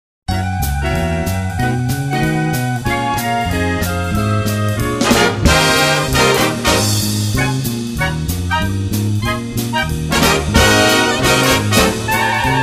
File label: jazz